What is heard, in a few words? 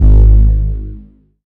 boom